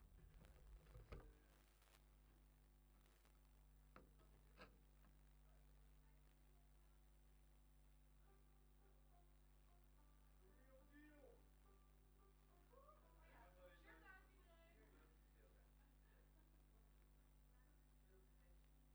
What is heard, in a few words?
Bass DI